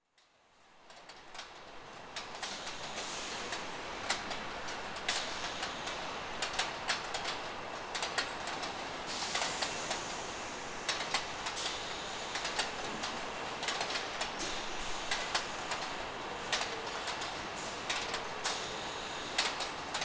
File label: tyre factory floor factory ambience conveyor belt pneumatic decompression